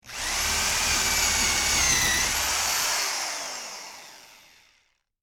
Electric Drill, Industry, Electric, Drill, Wood, Bit, Into, Wood; Drills